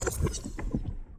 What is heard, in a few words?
room deactivation